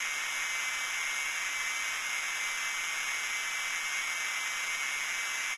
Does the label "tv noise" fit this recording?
Yes